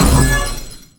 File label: checkpoint